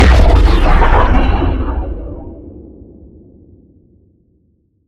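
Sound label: Sci weapon Shot Large Gun Gravity Turret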